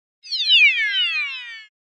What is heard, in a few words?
Comic Slide Short Going Down; Hollywood Edge; sound designer's toolkit v2